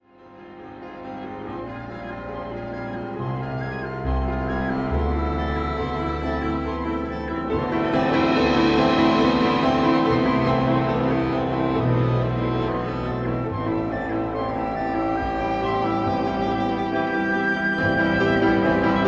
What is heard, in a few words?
Etudes; Creamer